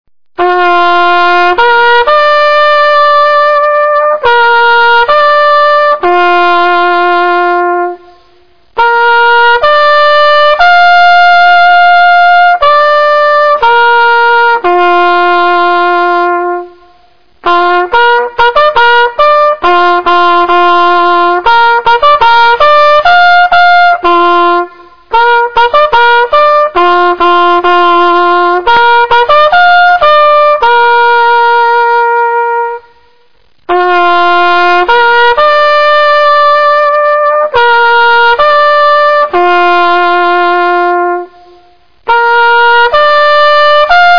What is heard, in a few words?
church call, bugle; usscouts